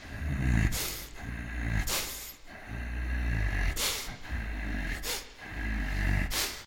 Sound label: breathing; the wolfs night